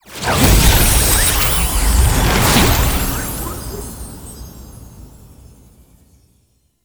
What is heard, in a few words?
Stinger Enter; Warframe; Nokko Colony; Visions